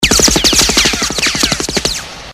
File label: machinegun ricochets, weapons